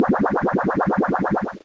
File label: Bubblebeam; pokemon reborn e19 2022